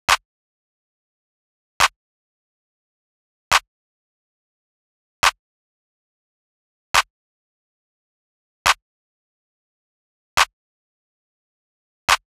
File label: drum loop 140 BPM clap; Loop Stems; Cymatics; DOPE DRUMS VOL. 1; Mind Games